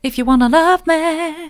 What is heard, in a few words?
soft, vocal